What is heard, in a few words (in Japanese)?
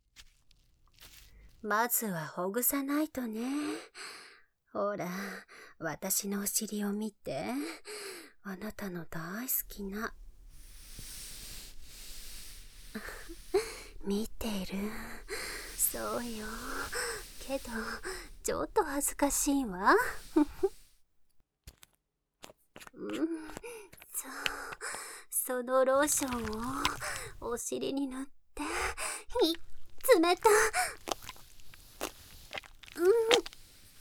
ローション愛撫 効果音入り; 実践!有機肥料の作り方 熟女指導員によるおほ声講習